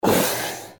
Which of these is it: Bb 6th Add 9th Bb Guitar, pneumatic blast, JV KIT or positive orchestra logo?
pneumatic blast